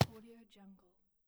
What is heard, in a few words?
grass drop